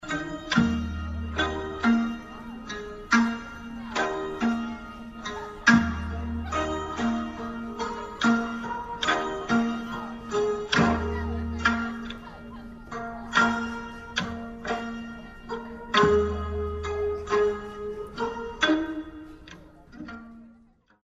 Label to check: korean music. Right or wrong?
Right